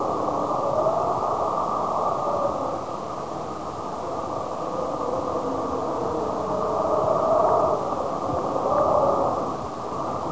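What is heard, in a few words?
ambience wind tunnel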